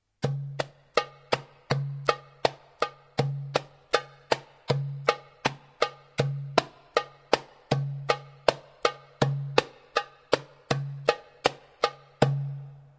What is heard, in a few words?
darbuka; notations